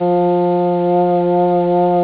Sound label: clarinet